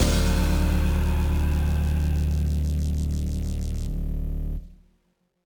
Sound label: Retry Jingle; Friday Night Funkin' Metal Mania Madness OST mod Windows gamerip 2023